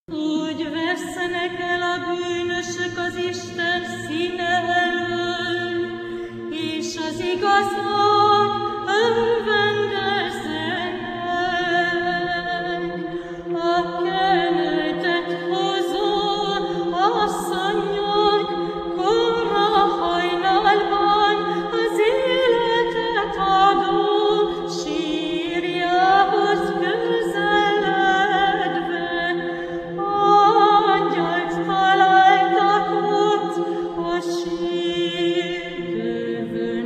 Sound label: Paschal Stichirons Hungarian; Stoudion